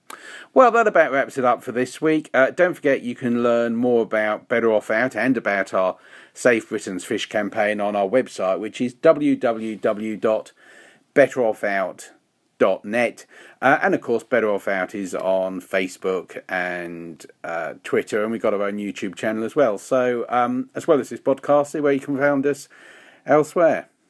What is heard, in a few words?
tail piece